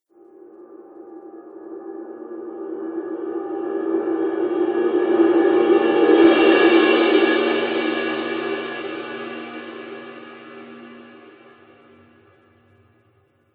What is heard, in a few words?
Reverse Cymbal Whoosh; Whoosh Sound Effects